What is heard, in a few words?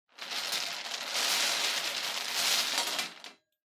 Bingo Machine, Spin Drop